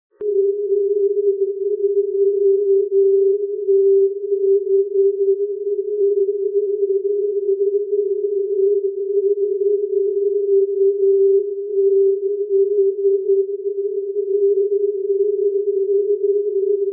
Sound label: 0.367MHz